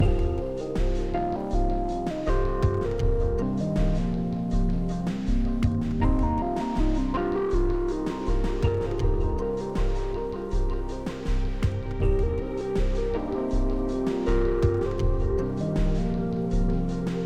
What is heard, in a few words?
Exploration 8CH 80BPM; Downtown Act1